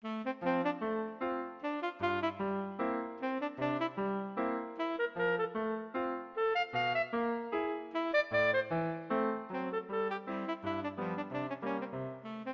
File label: saxophone